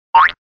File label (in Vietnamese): Tieng ot